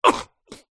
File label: human fight karate vocalisation male